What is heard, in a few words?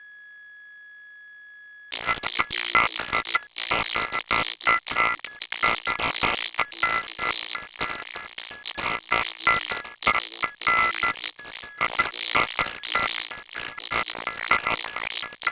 carrier wave; Transmission-X